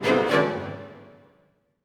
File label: Orchestral Loops STRING ACCENT